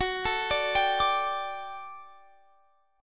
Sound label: D Suspended 4th F sharp, guitar